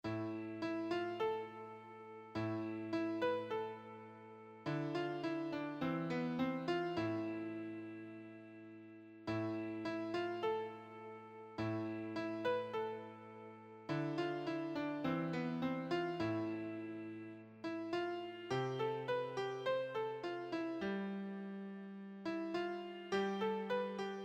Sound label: beginner solo sheet, piano